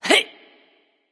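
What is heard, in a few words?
grunt hit, female; smite; voicepack; hera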